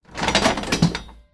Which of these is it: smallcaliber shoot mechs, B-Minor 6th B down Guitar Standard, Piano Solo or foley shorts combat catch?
smallcaliber shoot mechs